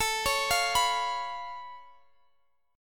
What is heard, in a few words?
C sharp Augmented 7th A, chord